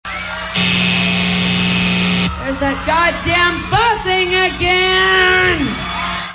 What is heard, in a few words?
Buzzing; Courtney doesn't like buzzing